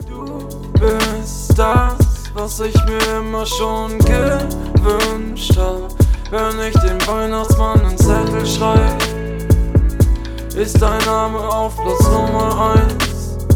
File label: Rap Beat 120BPM A Major